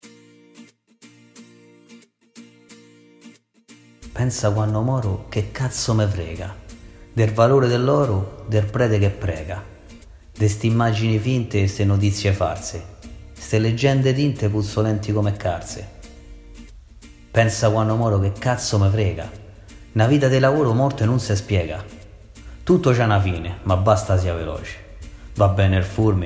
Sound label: song